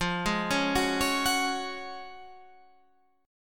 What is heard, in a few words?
Gb Major 7th Suspended 2nd